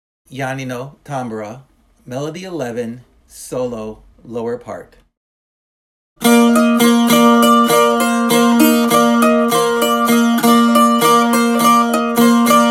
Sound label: tambura solo lower; Janino